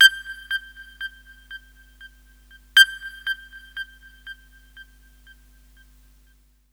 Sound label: SYNTH L; USB Soundscan vol.31; Miro s Grooves; AKAI